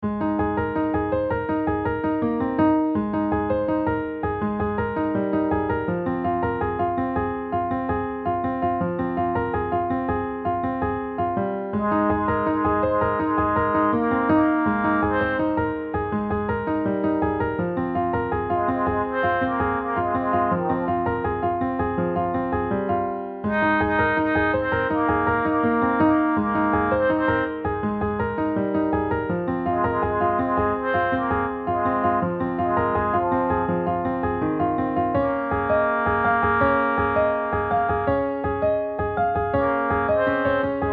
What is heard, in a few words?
piano melody